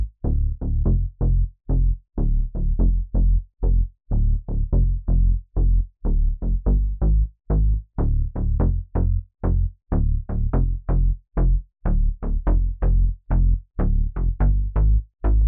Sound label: 124BPM PLUCK BASS D, Bass Loops